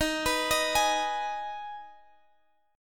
D sharp Suspended 4th Sharp 5th D sharp, Guitar Half Step Down